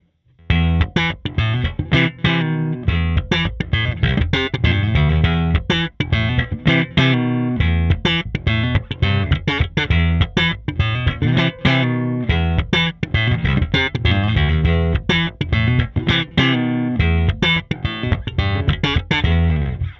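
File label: Preci Thump boost Amp, bass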